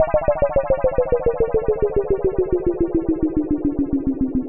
Moog Sweep Down; Ambienta Soundtrack

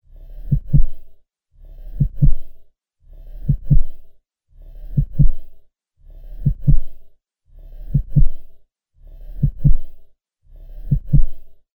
Heartbeat

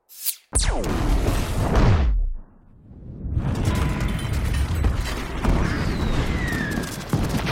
Space To Earth Explosion

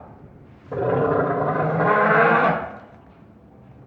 Dinosaur

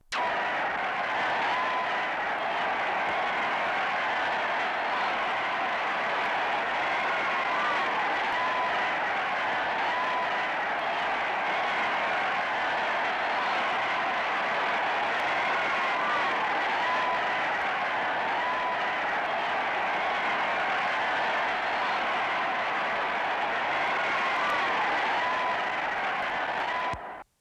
Large Crowd Cheering At Event

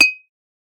Bottle Clink

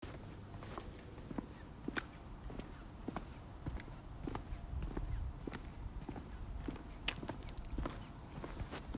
Steps

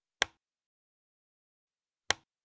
Desk Light Switch

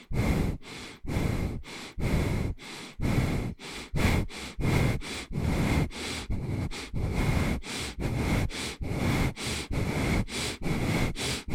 Heavy Breath Male

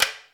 Button

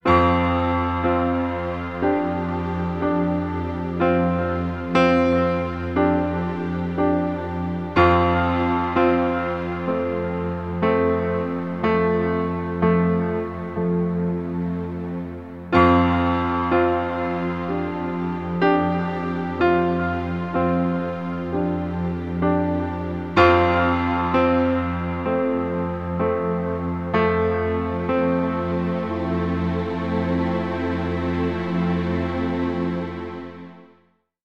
Delicate Piano And Strings Theme

